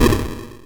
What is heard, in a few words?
bomb explosion